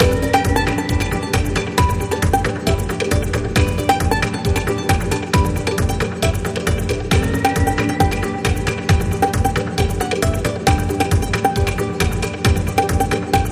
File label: Bonus Music Loop; Tiki Mania